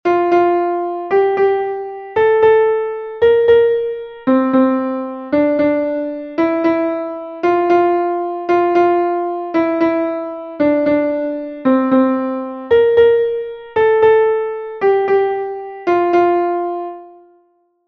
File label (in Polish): Gama F dur